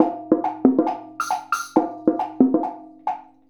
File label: Bongo and Wood Block